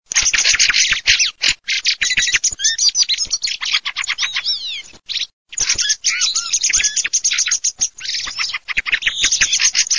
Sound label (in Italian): CESENA SCHIAMAZZO